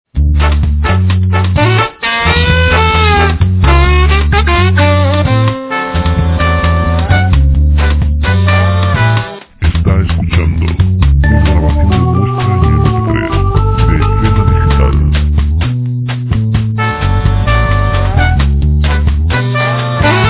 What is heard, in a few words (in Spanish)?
arreglos jazz